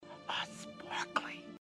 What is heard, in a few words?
sparkly